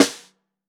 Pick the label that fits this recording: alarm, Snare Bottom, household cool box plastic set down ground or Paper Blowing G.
Snare Bottom